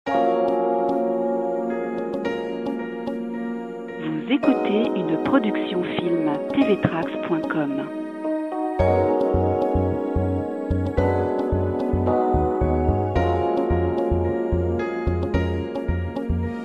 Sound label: Jazz piano, Uplifting Inspiring Royalty Free Music; Corporate, POP